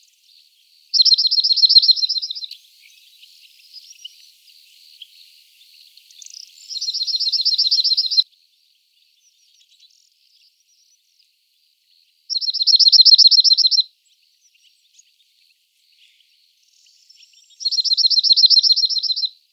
call, cirl bunting, Emberiza cirlus, Background: Goldfinch and Corn bunting; syllable type of these song phrases are different from all previous ones